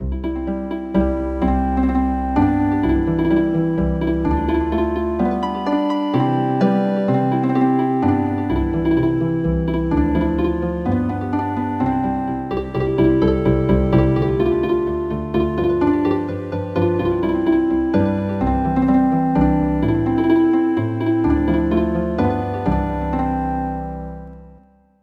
Healin Harp

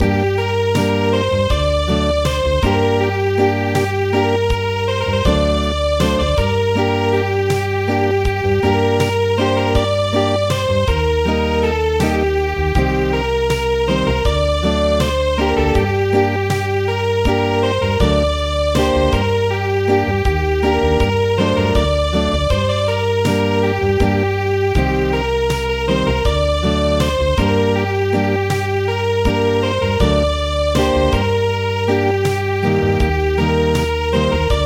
Acoustic; A little children

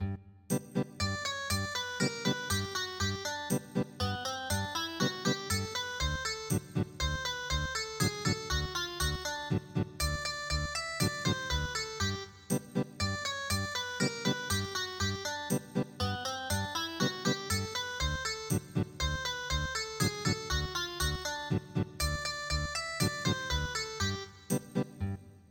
Mexican hat dance Ethnic Guitar

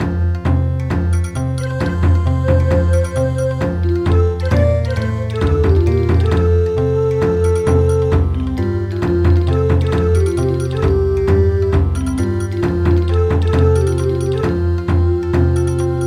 Bon Odori

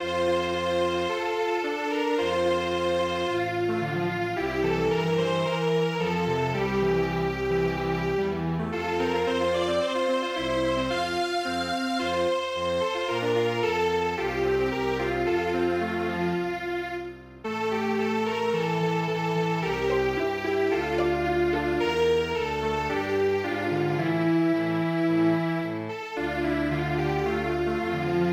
strings

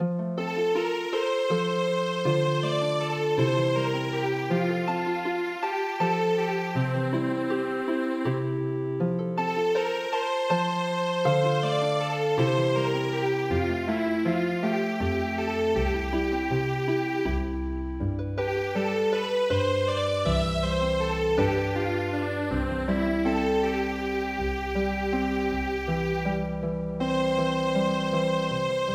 Serenade, Healing Harp; Gounod

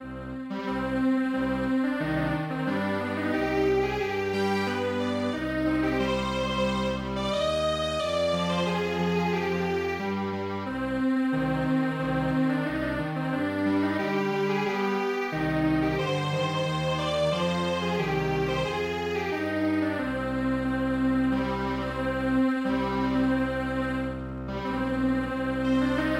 Strings Quartet

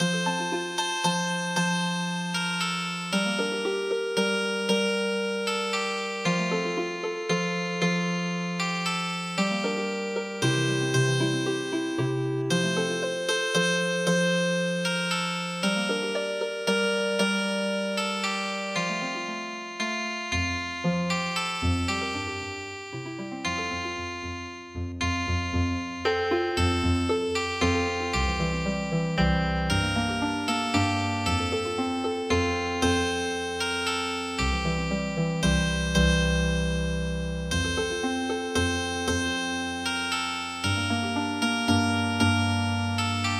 Healing Harp, midi traditional; Widele wedele

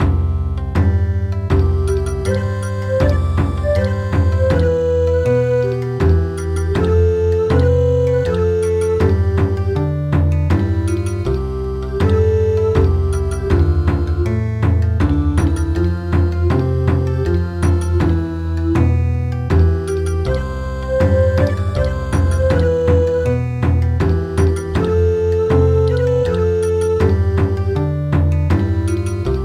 Bon Odori, midi; Serenade on the mountain

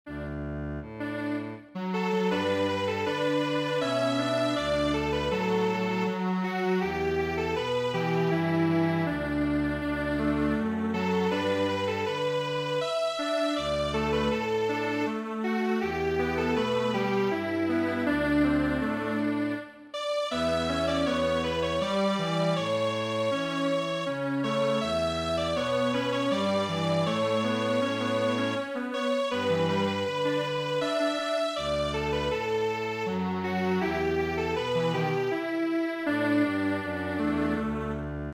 Strings Quartet; Schlaf ein mein Blond-Engelein

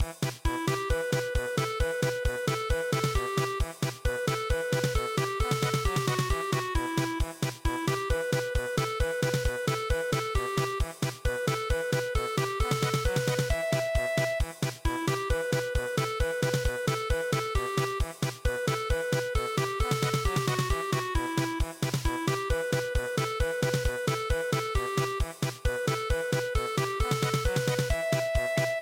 polka

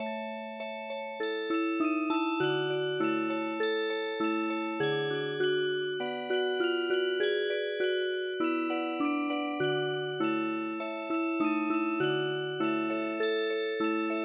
Music Box, traditional

